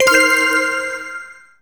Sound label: SE TICKET; maimai